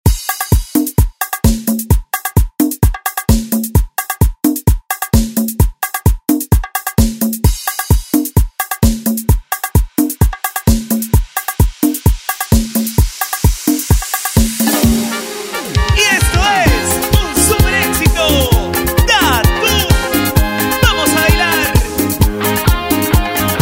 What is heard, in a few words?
Tropical Hit Pop Redrum; Deejay Hit